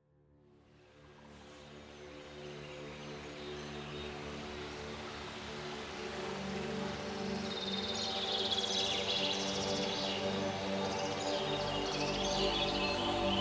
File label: Hooponopono Trance